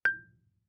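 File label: Marimba cord fortissimo G4; MIS Pitches - 2014; Percussion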